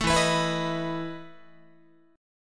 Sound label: E Major 7th Suspended 4th E down Guitar